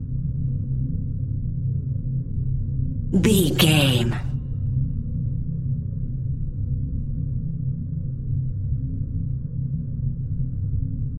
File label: sci-fi spaceship background room tone hum loop